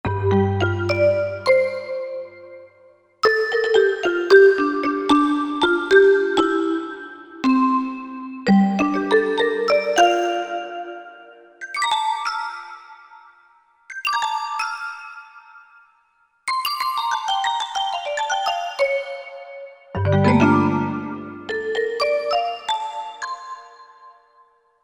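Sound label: Heavy Metal Vibe, Korg MSC-11S Brass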